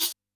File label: shake